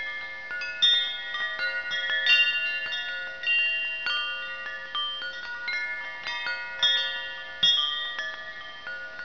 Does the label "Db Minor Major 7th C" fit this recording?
No